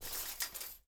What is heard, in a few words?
FOLEY Metal Fence Shake Very Subtle mono; Phasmophobia